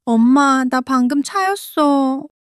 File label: crying; monologue